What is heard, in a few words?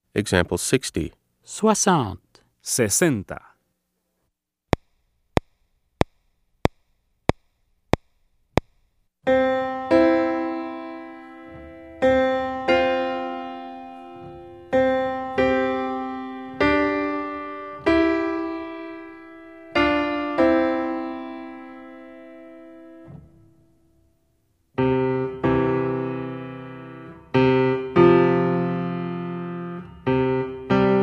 Keyboard